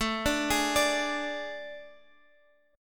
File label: A Major 7th Suspended 4th A down Guitar Standard, AM7sus4 chord; arpeggiated; JGuitar